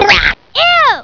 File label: puke